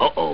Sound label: Cannt Link; lianliankan